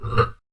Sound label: hurt, aliens; shevaar